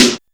grinder, snares real

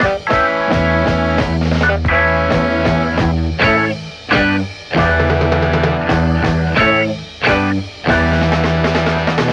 horns and guitar, loops; Phonky

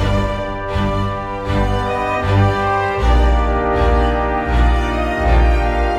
strings, Sad

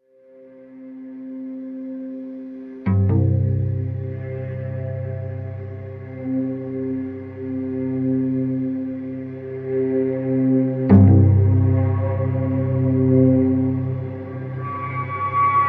strings, Sad Loops

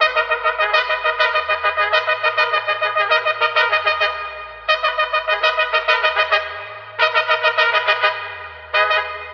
horns attention in morse, phonky loops

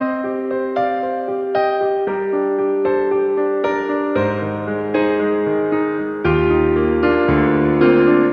piano, sad loop; loops

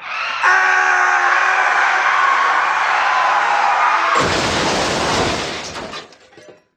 car crash with scream

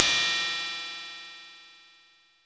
Hats Electro Open

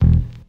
boomf, Bass; Notes

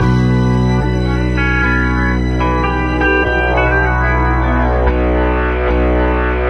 keys sinister, Sad Loops

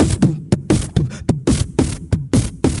beatbox

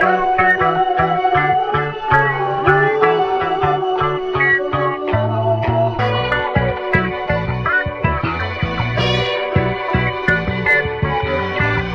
guitar, Loops; Phonky

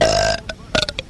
burp two bursts

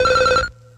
chirpy; Zaps and Beeps

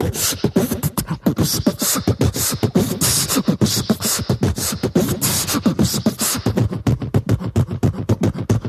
butcha, Beatbox, Drum loops

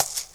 Shaker

